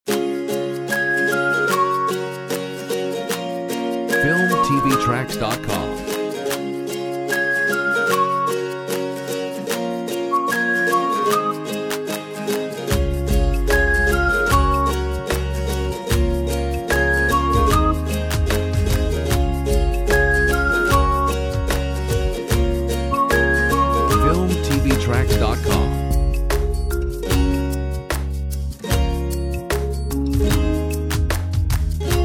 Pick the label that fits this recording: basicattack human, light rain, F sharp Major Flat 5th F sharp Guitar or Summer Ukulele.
Summer Ukulele